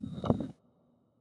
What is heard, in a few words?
Pickup; Martian Chess